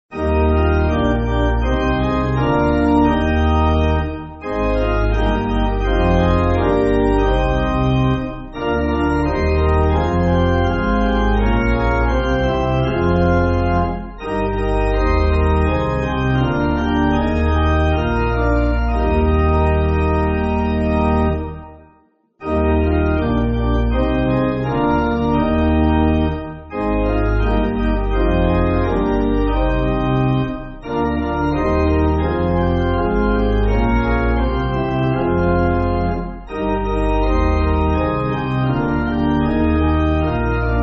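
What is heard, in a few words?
Pipe Organ, church music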